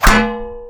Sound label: pan hit; zumbi game